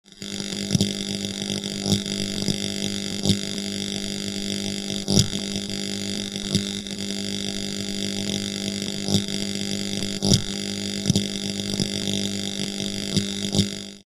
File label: Sfx Magnet; nyan cat lost in space